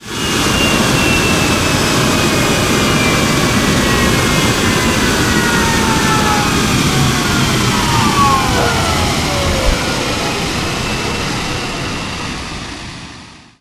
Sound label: engine stop; War Thunder